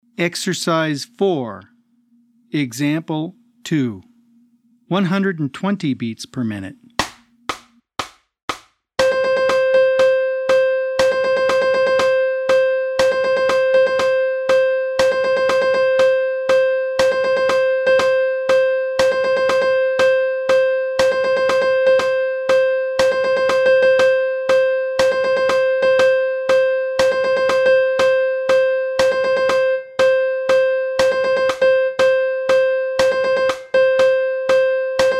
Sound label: 120 BPM